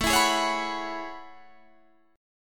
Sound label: A Major 13th, down Guitar Standard; AM13; strummed; JGuitar